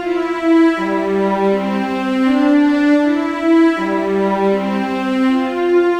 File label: Celli, ambient